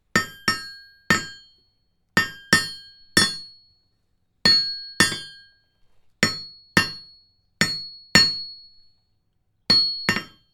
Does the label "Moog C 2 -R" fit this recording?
No